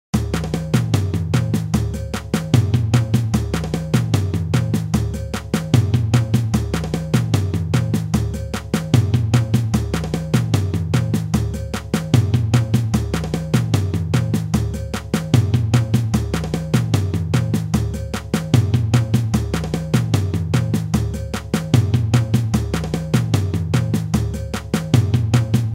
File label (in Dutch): basispatroon samba reggae